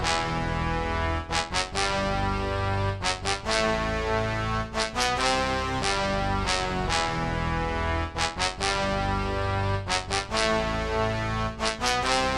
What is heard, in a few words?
tuba